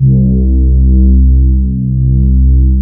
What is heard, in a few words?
ARP, Analog Fantasies; USB Soundscan vol.49; AKAI